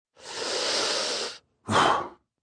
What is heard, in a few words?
Breathing Deep, Breathing, male, inhale, exhale; human voice